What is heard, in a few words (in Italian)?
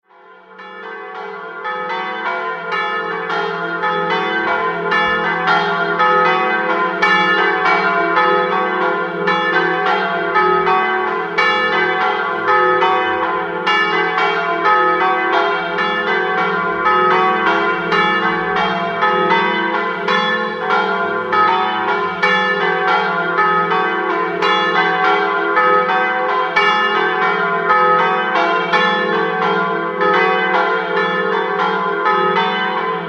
campane; FARLA